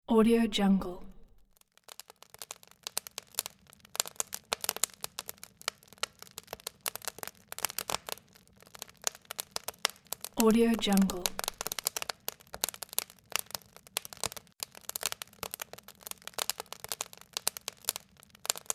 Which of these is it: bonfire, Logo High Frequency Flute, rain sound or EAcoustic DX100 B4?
bonfire